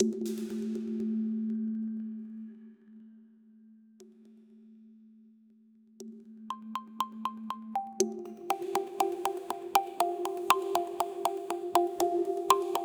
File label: ritual mystical percussio